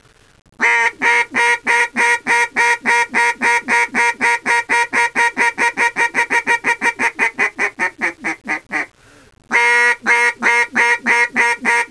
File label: hail call, hunting calling